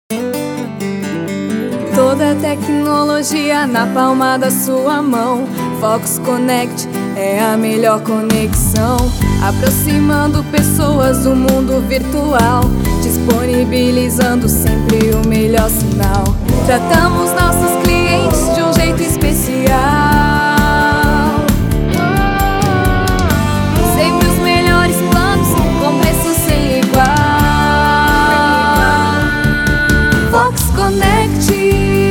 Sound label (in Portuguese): Jingle Internet; Fox Conect